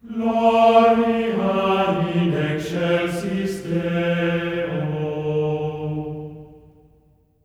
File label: GLORIA D#4, Symphony of Voices; Spectrasonics; GR GLORIA